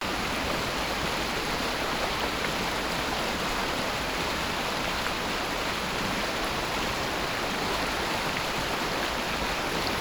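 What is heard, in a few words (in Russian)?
водный поток течение воды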